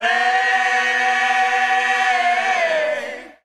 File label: ayayay